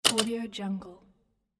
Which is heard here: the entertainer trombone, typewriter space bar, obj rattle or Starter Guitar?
typewriter space bar